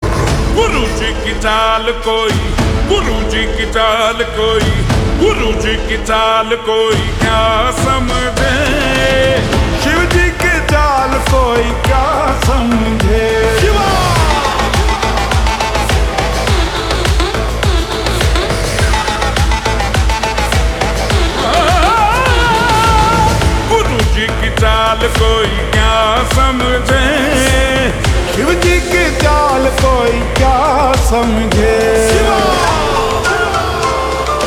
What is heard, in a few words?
Ringtone Slow Reverb